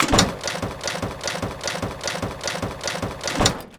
steampunk mechanical gear chain element start stop; Bluezone Corporation